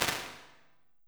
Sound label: Stairs, Impulse Responses; Medium Spaces; Indoor Spaces; Apple